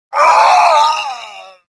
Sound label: death; Star Wars Jedi Knight II Jedi Outcast; XBOX; shadow